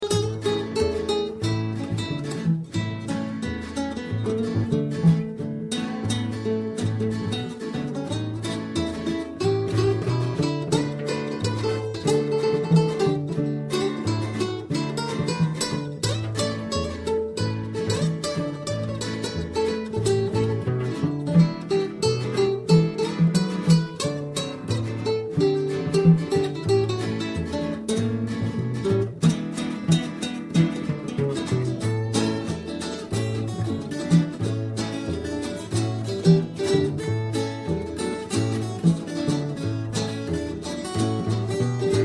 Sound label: Trio blues